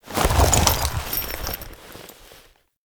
Horse Gallop Start Whip; Vehicles; Foley Actions; Warframe; Duviri

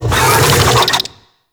Infested Big Ashen Vocal Attack; Warframe

